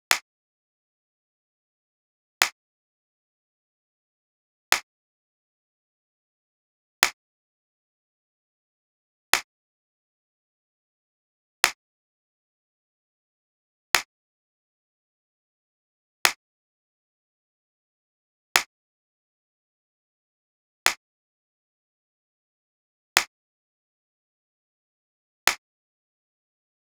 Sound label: Clap Track; XLO - Test & Burn in CD (Gold); XLO Reference Recordings